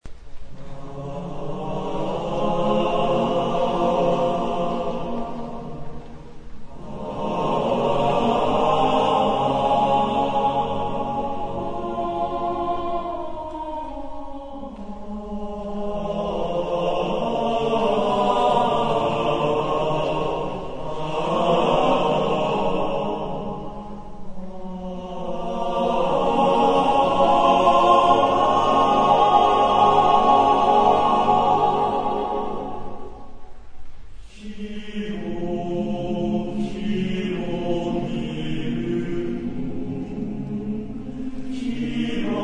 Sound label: male; Nobunaga; Ima boku ni; chorus